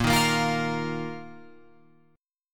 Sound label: A sharp Suspended 4th Guitar Open F; strummed; A#sus4; JGuitar